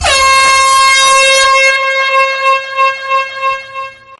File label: loud horn